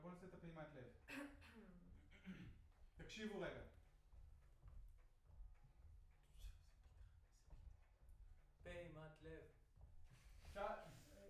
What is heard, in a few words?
Choir Far